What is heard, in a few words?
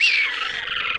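death, birds; Giants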